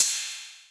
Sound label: crash, Millenium MPS-400